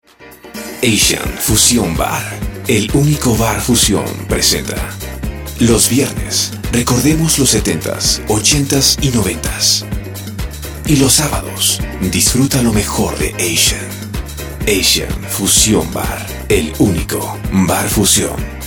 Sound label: ASIAN FUSION